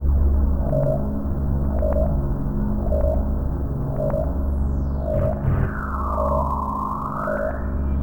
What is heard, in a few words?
Ambient spook; Halo 2; Xbox 360